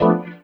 Clav Chord Hi; Sonic Foundry (Sony Creative Software); Synchro Funk; One Shot Sonics

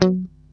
F#3, funky guitar

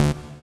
SYN Poly D; Best Service Gigapack 1

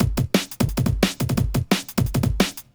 Kicker 175, Breaks, Drum&Bass; EZ Rollers

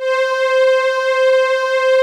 JX8P STR C4, SYN STR

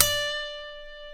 E, funk guitars

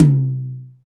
TOM TOM, Real

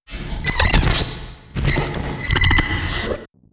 portal glitch

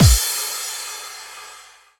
Main Room Stacked Hit; essential drumkit

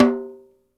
SN OFF, Drumworks; Sampleheads - New York City Drumworks VOL-1; SW KITS

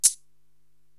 Shaker Hit, Drum Machines, Korg TR-Rack

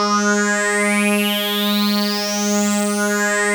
OB G4, Rare Synthesizer; Hollywood Edge - Giorgio Moroder Rare Synthesizer Collection; OB 4 VOX

